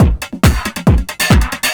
drum 138, Techno; Techno ID; PS-45

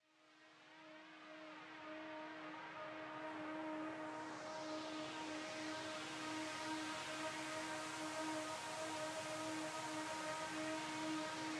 air pad, Smooth RnB, Ebmin; Big Fish Audio; Legendary; Happiness

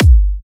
G, Kicks; markus hakala

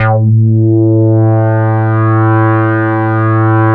DIVE, ARP 2600; First Call Vintage Keyboards

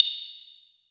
Percs, Elektron MachineDrum SPS1 MKII

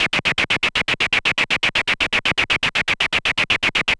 Gated Seq Noise, 120BPM

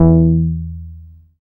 BAS MKS-50; Sound & Vision - Gigapack II CD 1

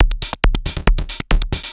percussion loops, 138, Techno

